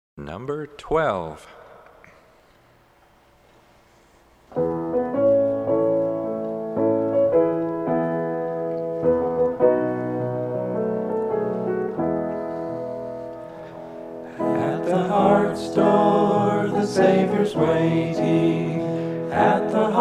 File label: Hymn